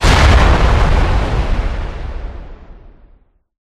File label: bonus stopper explosion; Recode; rampage riches Desktop